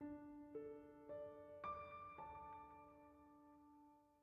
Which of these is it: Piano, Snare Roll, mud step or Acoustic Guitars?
Piano